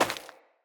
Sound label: Grunt Step Default, footsteps